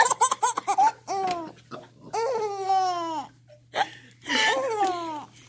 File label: Baby Laugh